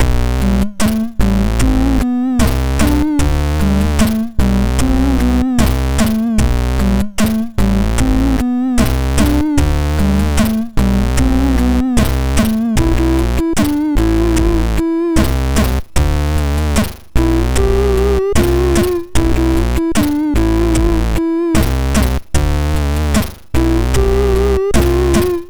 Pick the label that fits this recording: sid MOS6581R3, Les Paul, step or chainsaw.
sid MOS6581R3